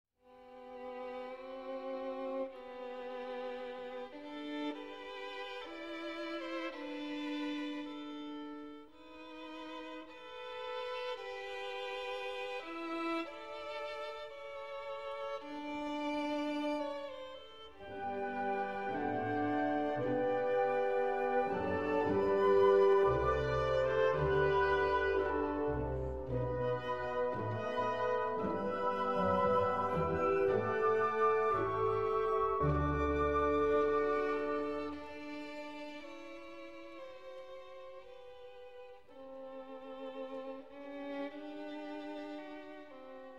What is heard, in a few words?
Violin Romance; No. 1 in G Major, Op. 40